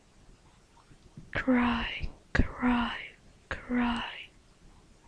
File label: cry